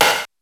R8 DANCE KIT; Rubber Chicken Software Sample Bank; DRUMS + PERC

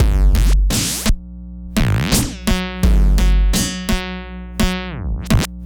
Structured Rhythm, IDM Rhythms

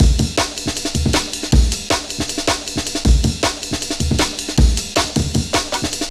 amen; M8

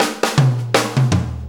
Shuffle Fill, Drum Tools; Sonic Foundry (Sony Creative Software); Loops & Samples Drum Tools; Shuffles; Fills

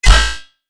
max min operator, bass

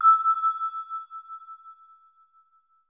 BELLS E5; SYNTH WAVES; Music Store Sound Service; Einstein

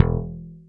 P-B SLAP E2, BASS; Greytsounds; JAZZ+P BASS

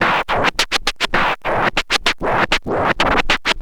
scratch short, Vinyl scratches

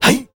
HIEE, World Voices, Afro noise; ILIO; Vocal Planet VOL-5

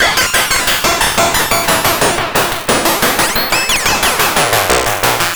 Serge, Synths Arpeggios; Electronic Point Blank

